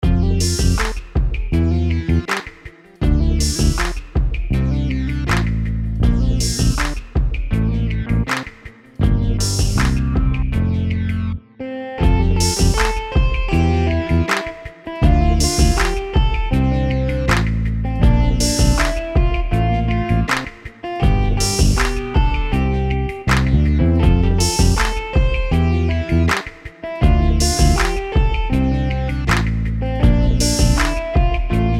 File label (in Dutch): zonder zang